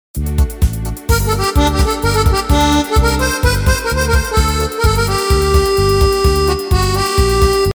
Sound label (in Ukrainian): vyvatek akordeon